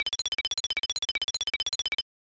Jingle; Cool Spot; SNES

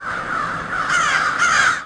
SFX ambiences Crows; Clumsy Ninja; Android, iOS

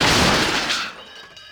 Pots Crash; The Princess and the Frog; wii windows; Hub Diner; Light Buford

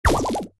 Spider Die

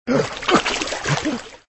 Drown

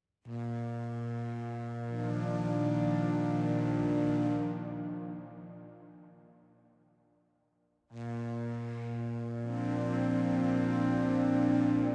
Night Orchard Intro Music

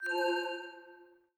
Face Detected

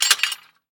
Fence Rattle

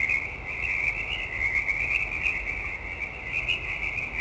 Hub Swamp Amb; Hub Swamp Amb Loop

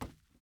wood step

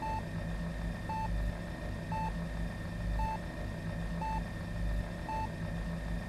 Ambient Medbay Room; Among Us

